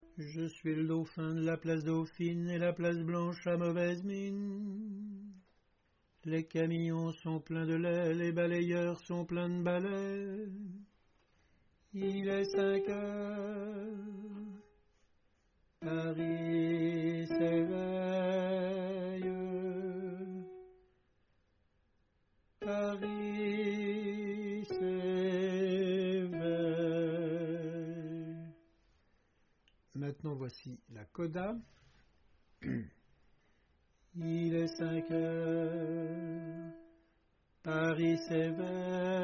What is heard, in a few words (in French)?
alto